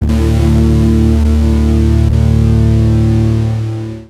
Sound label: Bass FX; Basses